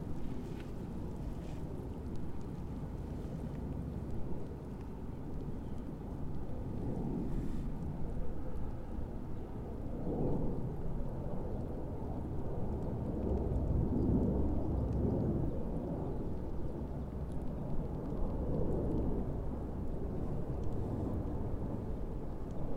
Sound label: Cricket Field Leeds; cricket ground; scott hall road; grosbritannien